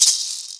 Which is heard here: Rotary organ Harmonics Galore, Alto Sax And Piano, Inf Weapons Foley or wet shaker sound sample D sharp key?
wet shaker sound sample D sharp key